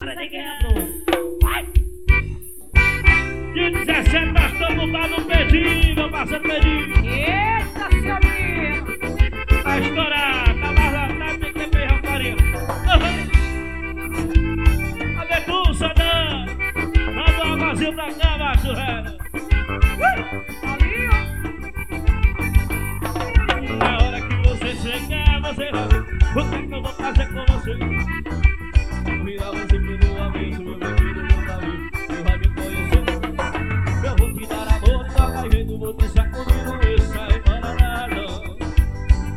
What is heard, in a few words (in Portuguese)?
forro promocional; piaui; anderson gravacoes